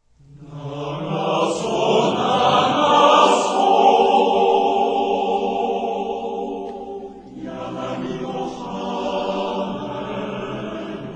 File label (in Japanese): aogaeru